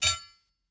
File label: sword hit